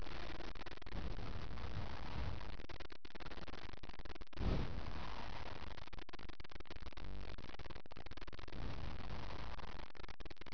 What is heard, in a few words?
silence